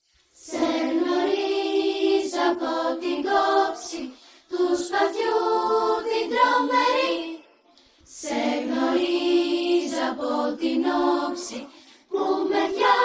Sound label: vocals